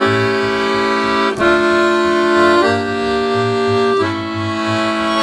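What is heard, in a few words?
Balkanish Traditions accordion; USB Soundscan vol.63; AKAI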